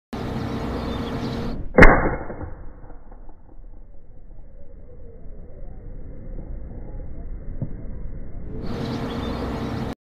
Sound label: pcp gun; اسلحه پی سی پی